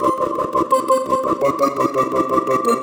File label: Splinkle C, Pad Loops; Cyclick Samples; Kit One